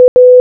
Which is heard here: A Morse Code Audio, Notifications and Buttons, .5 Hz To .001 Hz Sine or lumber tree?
A Morse Code Audio